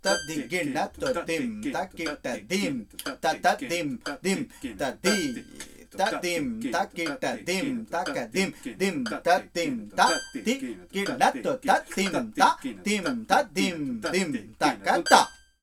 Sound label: polypulse 3 5 misra